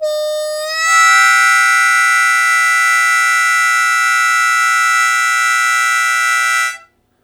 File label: Blues Chord Attack A1, Harmonica; Zero G - Harmonica